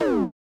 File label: UI Cancel; Era's Time Delivery Service